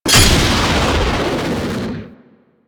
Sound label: Rocket Pack Launch; Attacks; Warframe; Grineer Ghouls